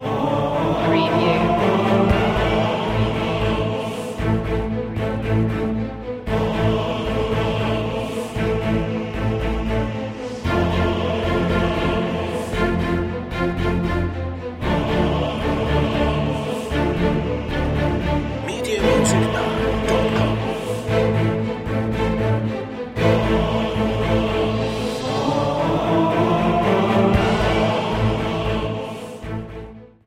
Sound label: no perc